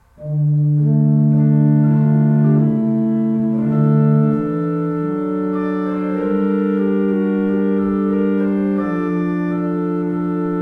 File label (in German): Walcker, gebrauchtorgel